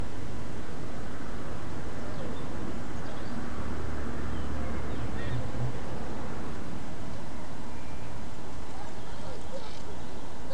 riverside soundscape in stereo including birdsong and a siren